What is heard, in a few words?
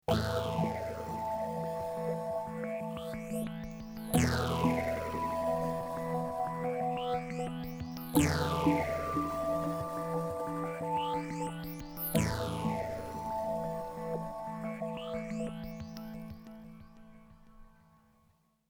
kinetic dry